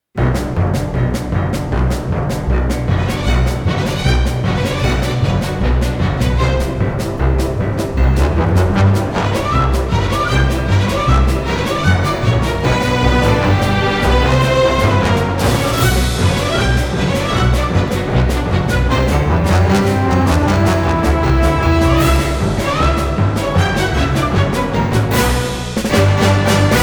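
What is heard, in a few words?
(No Choir), trailer music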